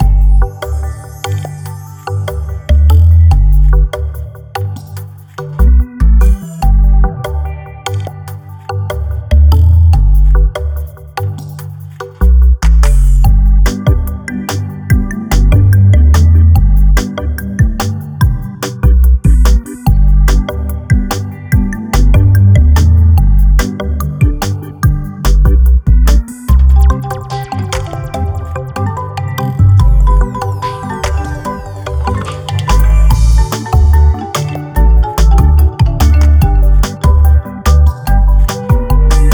game background 145bpm; Pack Master; audiojungle

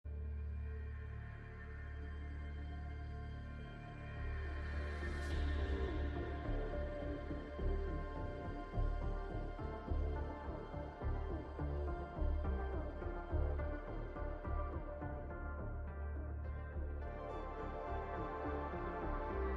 Galactic Map Ambience Theme; Helldivers 2

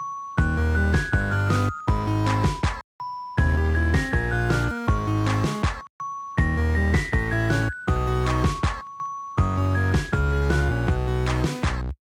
Icecream Truck Christmas; Lethal Company

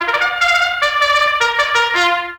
Race Start

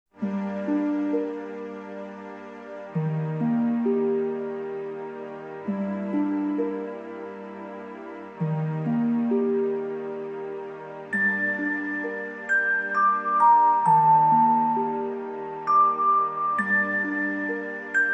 Strings Version; maten densetsu real dimension music; Field - Legend of Maten Strings Version

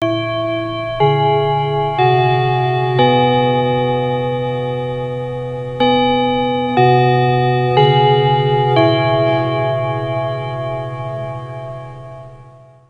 Chime Short; RPG Time: The Legend of Wright; Xbox Series X/S

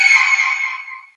Scream splosion; Cruelty Squad

